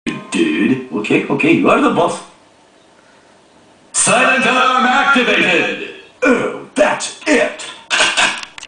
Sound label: silent alarm activated; the simpsons